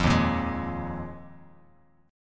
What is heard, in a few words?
Eb Suspended 2nd Suspended 4th, Bass Standard; Ebsus2sus4 strummed; JGuitar